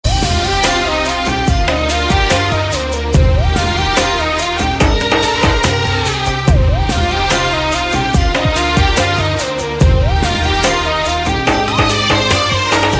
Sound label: jazz musical